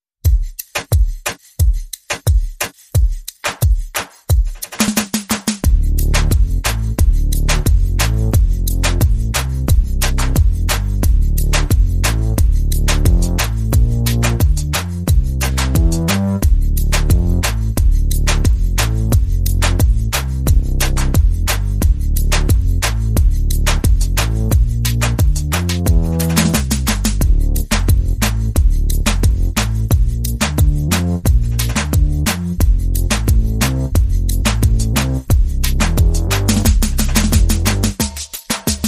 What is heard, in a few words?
Bass Perc and FX Only